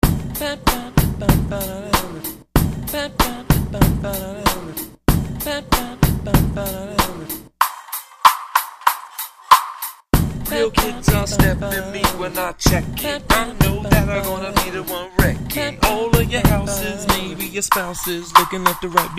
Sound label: groove